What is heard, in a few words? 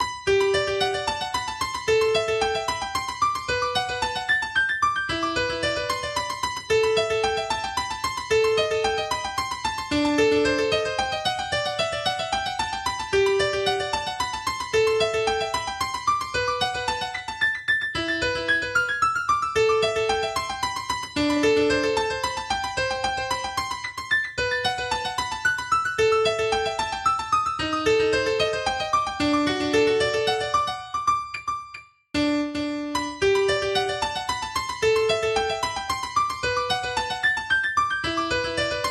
House